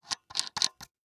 Technology Electronic Light 150 Watt Fresnel Light Knob Tightening Fast